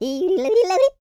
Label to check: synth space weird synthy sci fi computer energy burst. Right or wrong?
Wrong